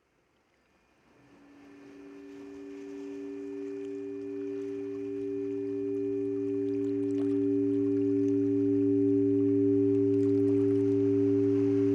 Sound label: 3D Ambisonic